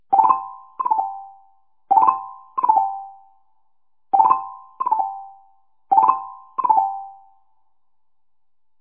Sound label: Xylophone, chime; ring2 chimepro bundle